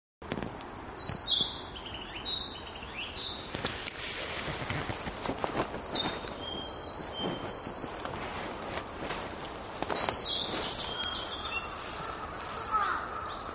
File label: kibitaki